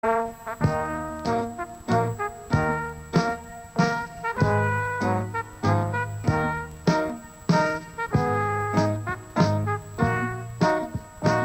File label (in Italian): Pende un uomo strumentale